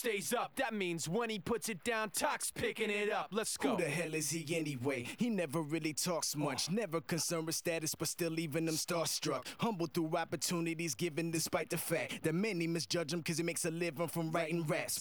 vocals; SiSEC11

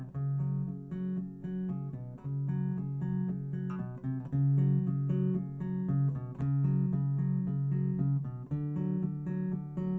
wdrums liverec 250ms 5cm; SiSEC11; underdetermined

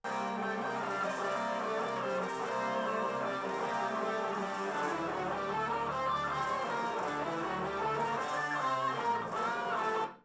nodrums mix; srec 380ms 4cm; SiSEC10; underdetermined

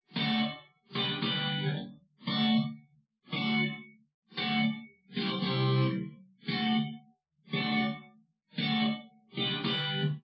drums; SiSEC10; underdetermined

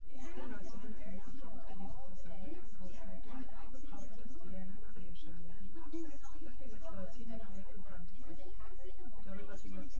female synth conv sim; SASSEC07

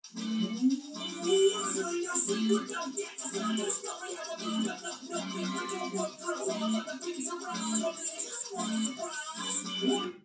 wdrums; SiSEC10; underdetermined

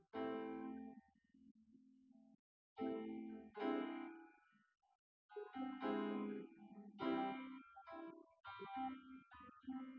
nodrums synthconv; SiSEC08; SiSEC underdetermined

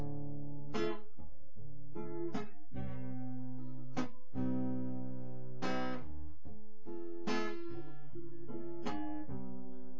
nodrums synthconv 250ms 5cm

